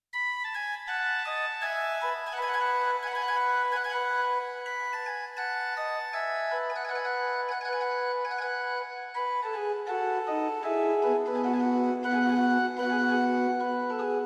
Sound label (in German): CAPELLA, Digitalorgeln; Scarlatti